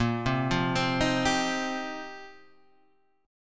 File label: F 9th Suspended 4th Bb, chord